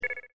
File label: PHONE